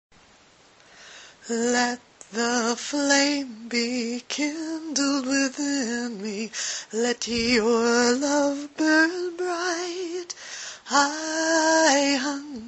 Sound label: Chanukah Chant